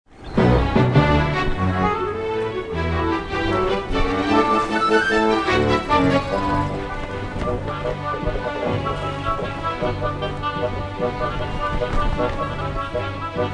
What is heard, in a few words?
And Yet Some More Swordfighting